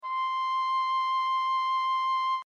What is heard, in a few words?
Oboe; Real Samples; oboe&horh